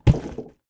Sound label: Drop Cardboard Box